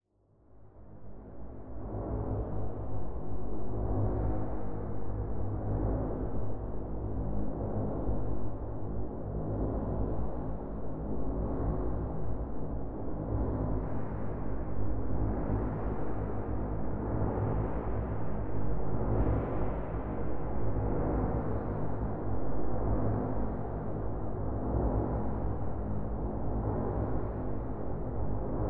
Magnetic Field; 99Sounds; Magnetic Fields

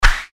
Fighting Swing Sound; FXHome

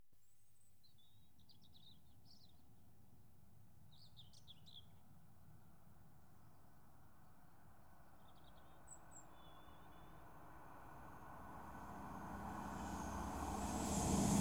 Trains Passing Inter City 125; BBC Sound Effects Library

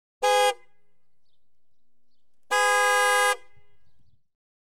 Motorcycle, BMW, Hooter; Transportation; BBC Sound Effects Library

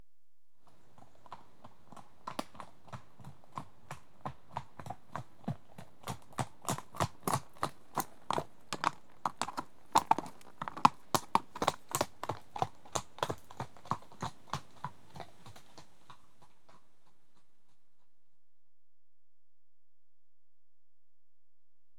One horse at trot on rought track; Horses; BBC Sound Effects Library

